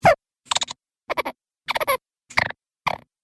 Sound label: 6 Funny Gremlins, monster sound effect; Andrew Fleming